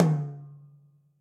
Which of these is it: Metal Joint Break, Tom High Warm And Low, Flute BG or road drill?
Tom High Warm And Low